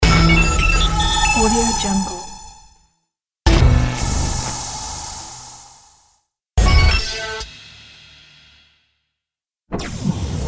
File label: robot battle sfx pack